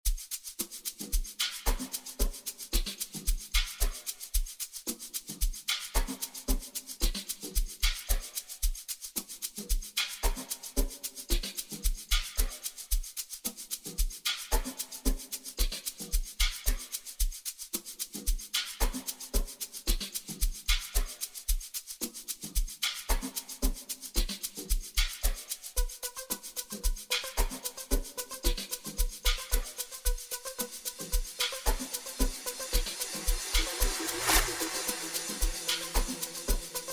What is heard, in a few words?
Bells And Bass